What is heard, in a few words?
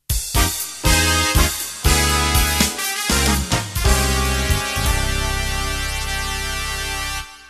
Jazz Funk End